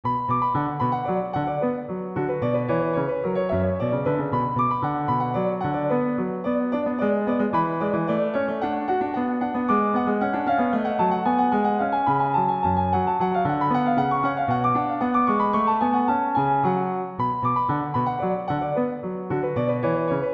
Orchestral suite; Badinerie; BWV 1067

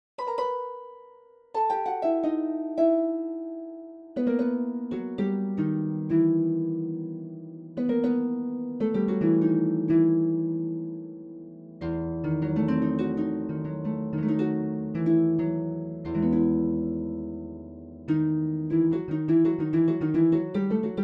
Bach Toccata with harp; Modartt Harp 5; Pianoteq

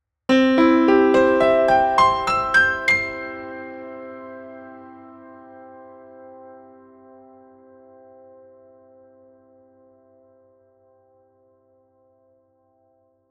CMaj

